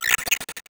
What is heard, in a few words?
drone chirp